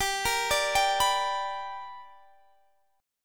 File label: B Minor Major 7th Sharp 5th